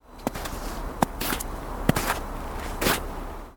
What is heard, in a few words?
steps